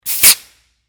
Air Hose Release, Industry, Air, Hose, Release, Tool; Air Pump Sound Effects; Machines